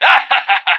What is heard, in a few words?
whack popup; Left 4 Dead 2; moustachio